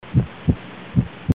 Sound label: venous hum